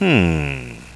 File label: non-commital - hummm; Spades Deluxe 1.6